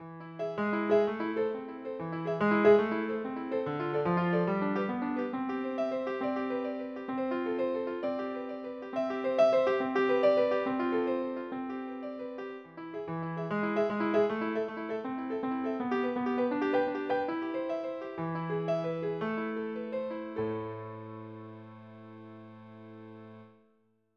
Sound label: GUITAR